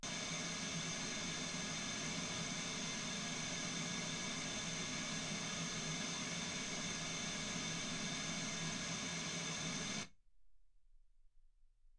wgn

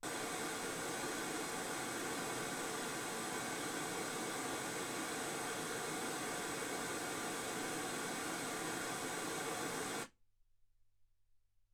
wgn

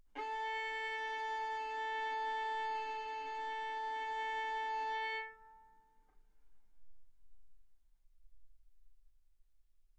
Violin arco A4 B4; mf; sul A; stereo

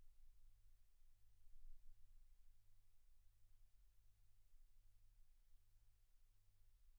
silence 48kHz

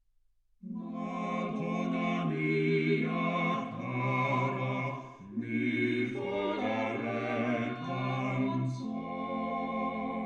quartet

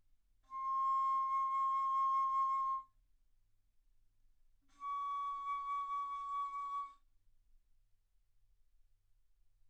Bass Flute C6 Db6

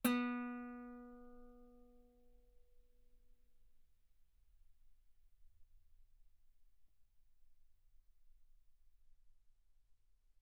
Guitar B3; ff; sul B

